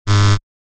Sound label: Noise Grimy Buzz Dron